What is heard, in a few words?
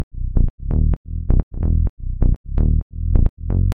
warm contact bass sequence B; loops